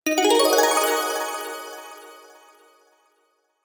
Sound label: fantasy magic wand spell cast appear layered rich; icecrownrares; zapsplat